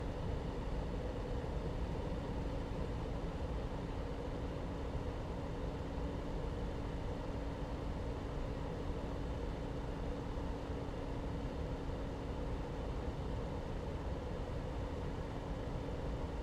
Ventilation Fan, small box, metal, electric motor, disconnected, whirring, spinning, med intensity; loop; Eiravaein Works; Vaeyan IV